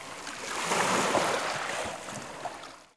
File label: shore, canals; Half Life Model Pack; Half Life 2; ambient levels canals